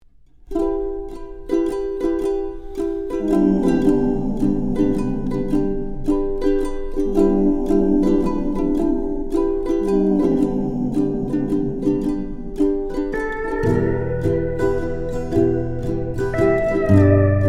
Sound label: Emotional Hawaii instrumental